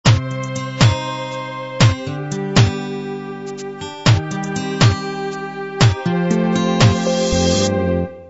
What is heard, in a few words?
16 beat ballad intro